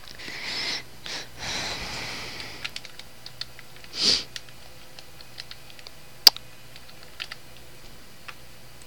random sounds

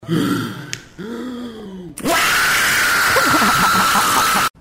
uaaaaaaaaa

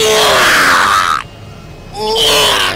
nyaah nyeegh

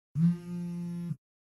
phone vibrating left

